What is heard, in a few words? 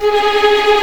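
G#4 tremolo p violin right, violins tremolo; East West; The Ultimate String Collection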